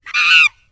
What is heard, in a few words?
monkey squawk low